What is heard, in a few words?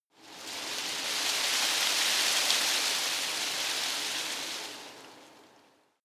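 tree foliage rustle soft, garten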